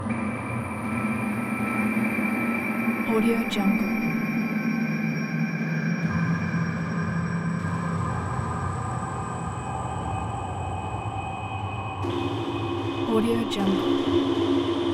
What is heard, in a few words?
ancient caverns loop roomtone layer; esmteam